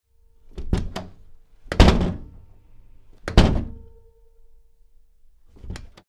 Glass House Door Open and Close; Interior Light wood and glass panel door; Screen Door

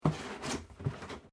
Cardboard box top unfold; Material components for constructing more complex sounds

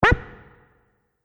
Multimedia Button; Button Sound Effects

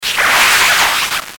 Static Bright Swell Crackle; Production Element Imaging Element Accent Transition; Blastwave

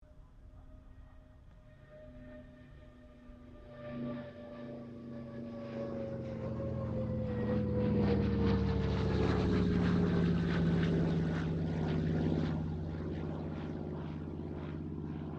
AIRCRAFT CA-13 BOOMERANG PASS; Airplanes; Vehicles

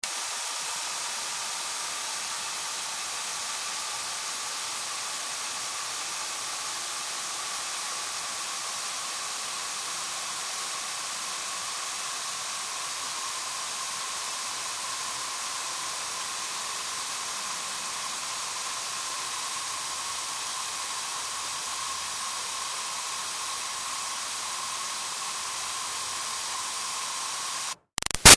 Water Spray Garden Hose Mist; Garden Hose Gun; Mist Setting; Hissy; Spraying; Objects; Big Room Sound

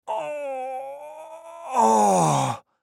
Human Groan Pain, Groan Painful Male Fifty Yrs; Blastwave

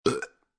Burp Short Burp Male

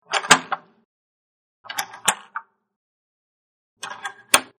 Door lock, Door Bolt Lock Locking; Door Bolt Lock Locking-3 Takes; Miscellaneous Doors

